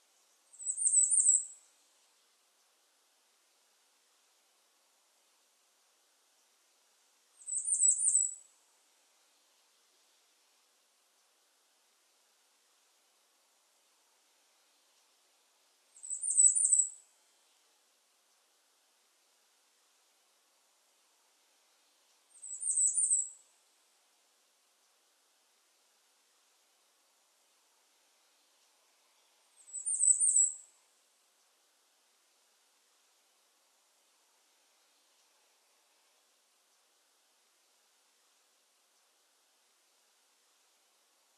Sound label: Bay-breasted Warbler, Setophaga castanea